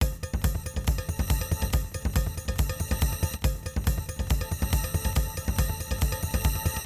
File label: TEKNO 140; Techno